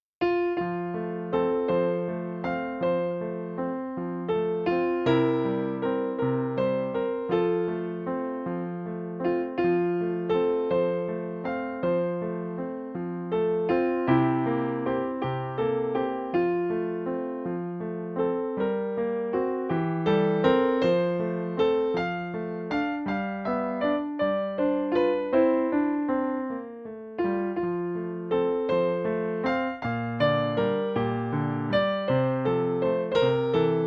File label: PIANO; Spring Song; W A MOZART